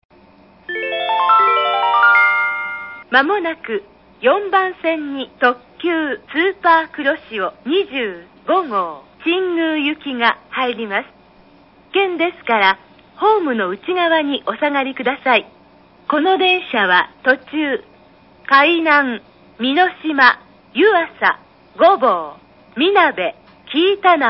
wakayama track4 superkuroshio25go; nishinihon

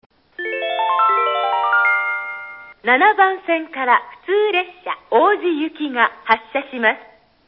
wakayama track7 loca oji

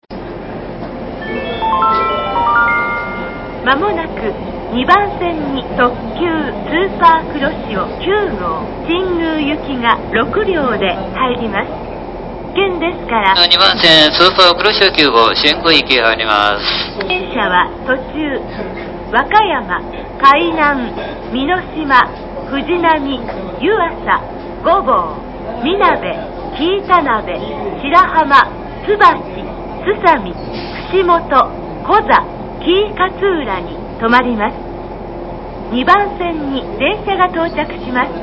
hineno track2 superkuroshio9go; nishinihon

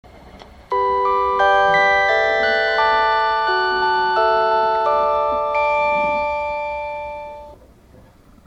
chime; kyusyu

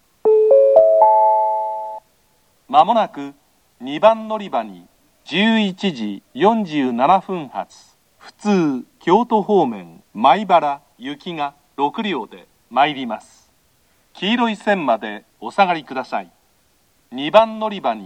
shimamoto track2 local maibara; nishinihon

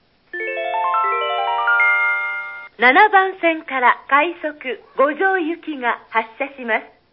wakayama track7 rapid gojo; nishinihon

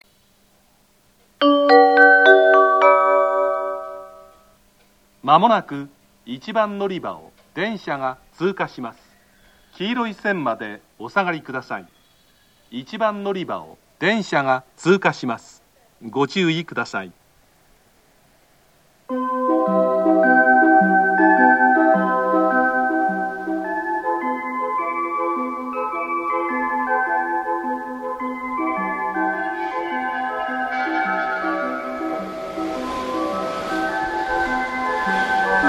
sumakaihinkoen track1; nishinihon

